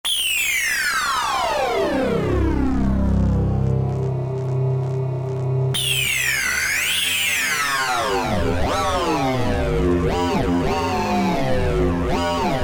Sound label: TimeZone SFX, Korg MS2000; Factory Patches